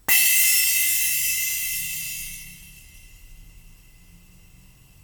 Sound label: Splash, drums singles; The Roots Drumloops